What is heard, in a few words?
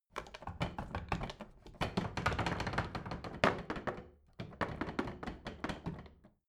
bathroom drawer rattle